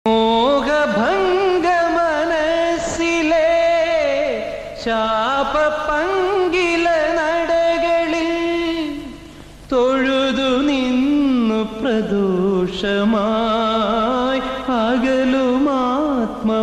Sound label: Malayalam song